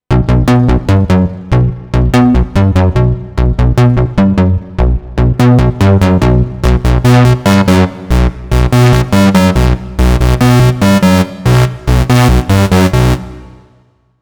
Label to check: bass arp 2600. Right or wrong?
Right